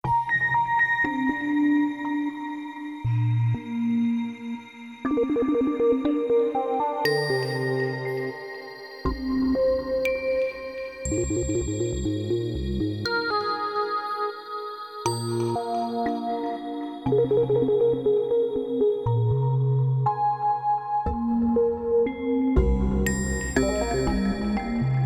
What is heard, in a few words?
alien rain